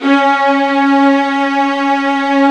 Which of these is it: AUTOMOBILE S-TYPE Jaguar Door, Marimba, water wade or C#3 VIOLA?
C#3 VIOLA